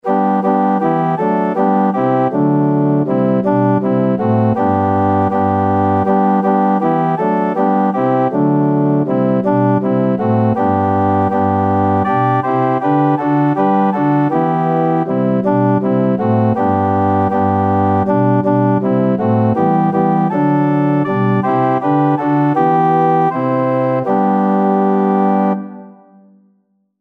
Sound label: ORG, organ